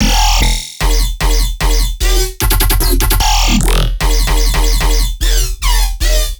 Bass Loop E, Dubstep, 150bpm; Simple Patternz; Ghosthack; Dubstep Sound Arsenal

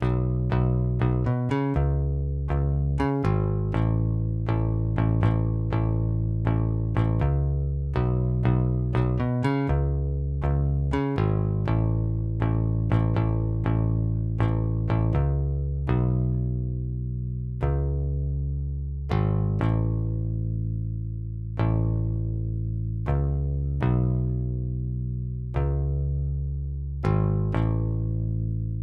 121 BPM C# Min Bass Guitar, Pop; Melodies; Loop Stems; Arena

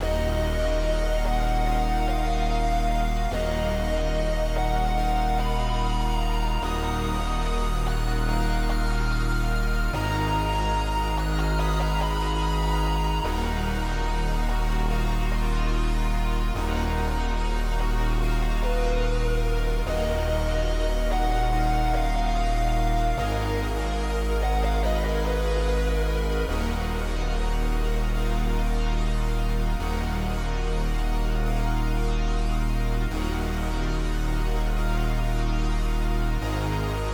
145 BPM D# Min, Analog; Melodies; Emerald

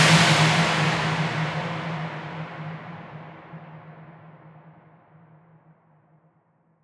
Noise Impact

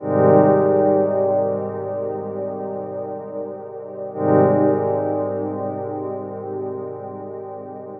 Dub Stab Loop Ab7 120, Dub Techno; Ghosthack; Dub Techno & Deep House Chords

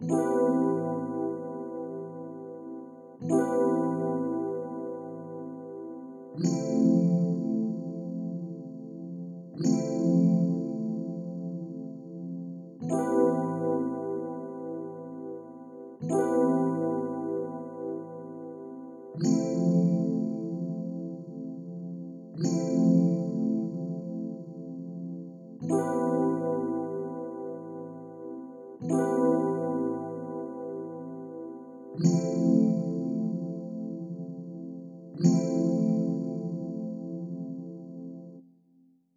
75 BPM A Min Key; Nationals; Retro Collection

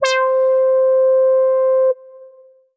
LEAD Santos (C); Melodic One Shots; Cymatics; ANALOG EVOLUTION Beta

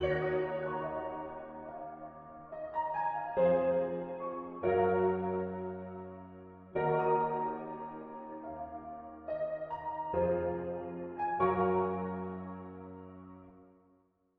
142 BPM A# Min Main Piano; Melody; RnB; Loop Stems; Cymatics; 2022 Melody Collection - RnB Pt 2; Maybach

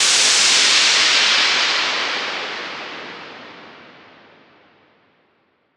Fx 130, Techno; Techno Sounds 2024

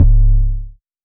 808 C#; Cymatics; Oracle Sample Pack; 808s

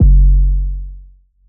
808 F#; Cymatics; Oracle Sample Pack; 808s

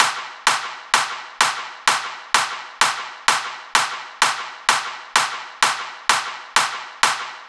Bigroom Kit F 128bpm Clap; EDM Drums; Drum Kits; Stems; Ghosthack; Ultimate EDM Drums; BigRoom Kit 02

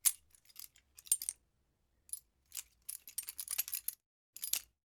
Butterfly Knife Movement

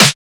Snare, techno drum shot; Techno Drum Shots 2024

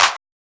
Clap, Techno Drums; One Shots; Ghosthack; Drum Mega Bundle Demo

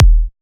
Kick G#, Psy Kick; Drums; Ghosthack; Ghosthack Advent Calendar 2017 Day 9 - Psy Kicks; Ghosthack 20 Free Psy Kick

